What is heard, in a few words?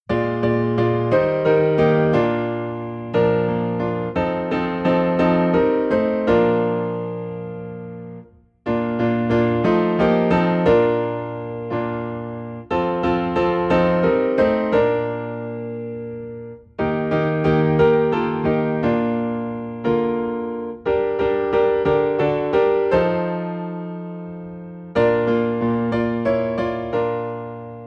Piano in B Flat; There Shall Be Showers of Blessing